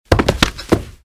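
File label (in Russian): падение на ковер; Звуки падения человека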